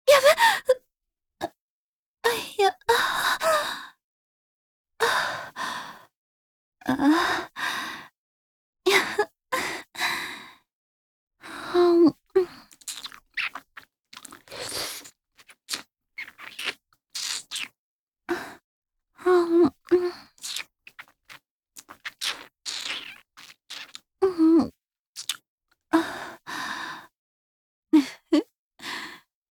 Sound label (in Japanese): チュパ音; チュパ音抜粋集; 顔射→頬に滴った精液を舐める